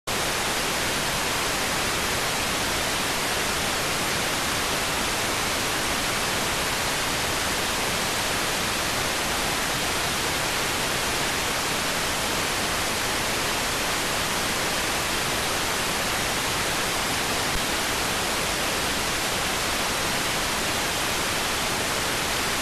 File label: rtty rx